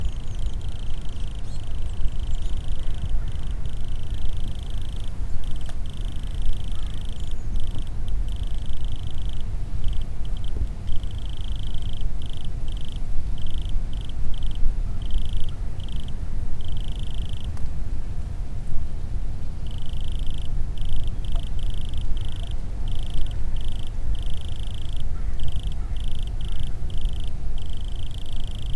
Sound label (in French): Grillon bois